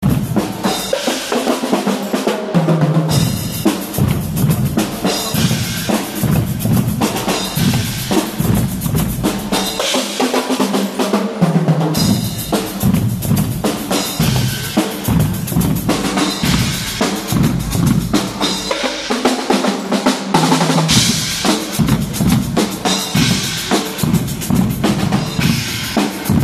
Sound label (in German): Rythmus